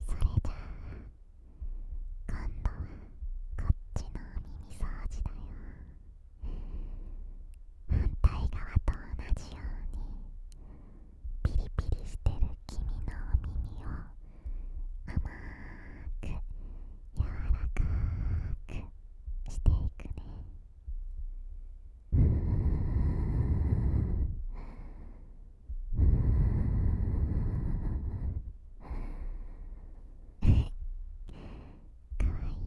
お姉ちゃんの甘い吐息（左耳）

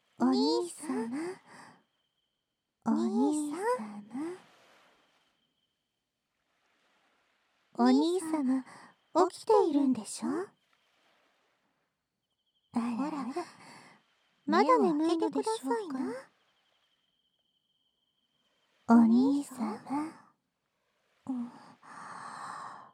二人の妹の両耳かき

